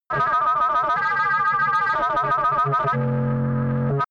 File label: crazy scientist, energie